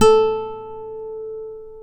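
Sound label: A3 FLAMENCO, FLAMENCO guitar; AKAI S6000 CD-ROM; WorldSounds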